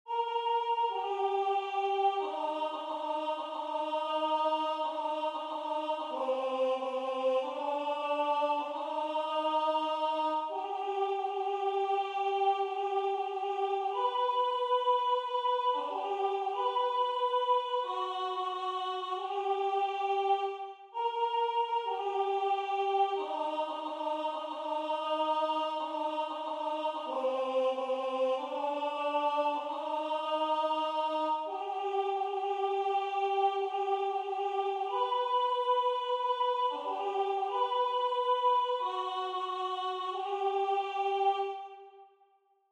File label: Alto; Chanton Piperri; Hymno final; Buenaventura Zapirain